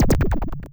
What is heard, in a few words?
liquid hit